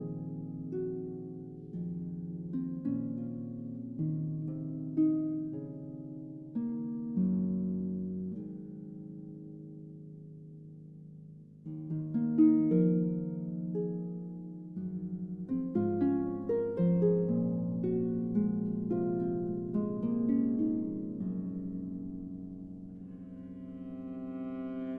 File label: Harp Concerto Lullaby